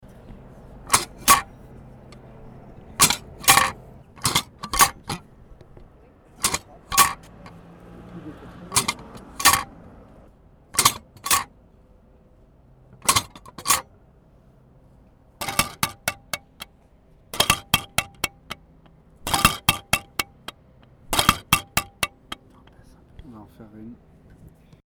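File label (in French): parcmètre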